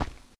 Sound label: Ocean Ground Tap; blastmorph pinball; xbox series xs